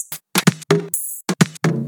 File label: Percussive Loops 128BPM; Vengeance Samples